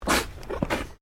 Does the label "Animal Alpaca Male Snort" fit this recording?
Yes